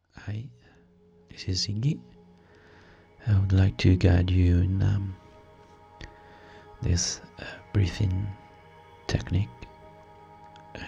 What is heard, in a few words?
Noisy Breathing